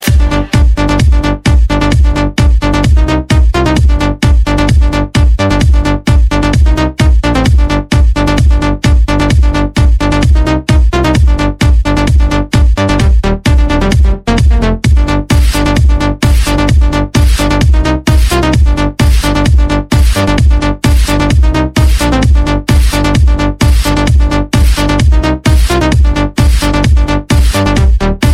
great trance

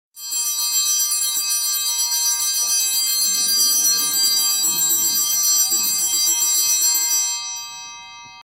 Angelus Bells

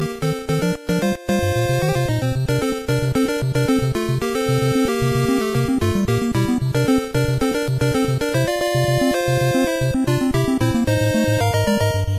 ice hockey; nes

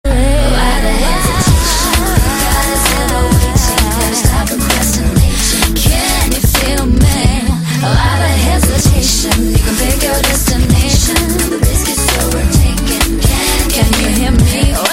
Snow Pian

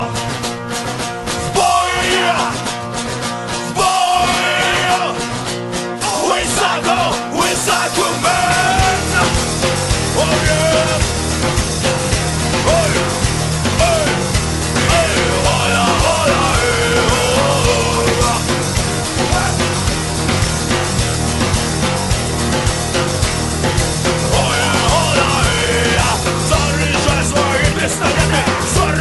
psycho rock

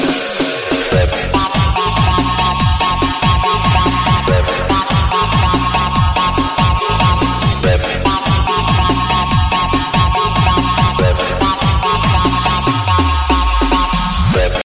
breakbeat

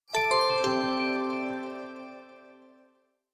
HTC Sense 5 Boot, ringtones

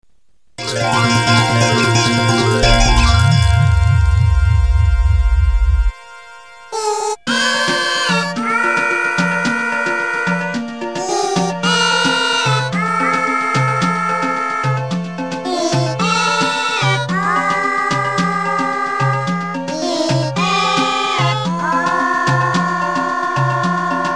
happy song; yoshi

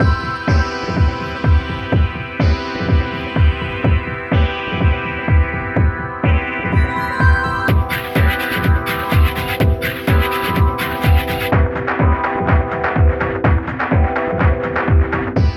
chillout drums

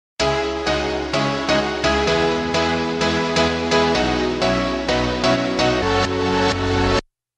Good Time Melody

